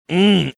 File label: mmmh